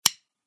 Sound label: tick